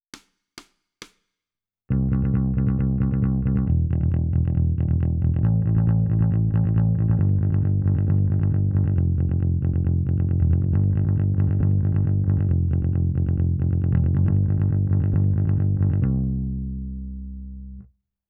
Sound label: Bass